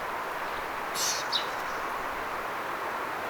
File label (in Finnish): viherpeipon aani